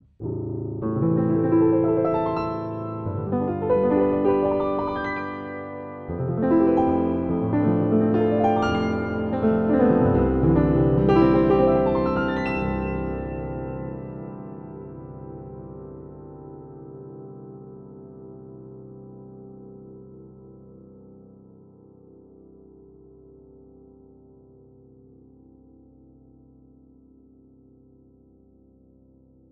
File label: improv moment; Trident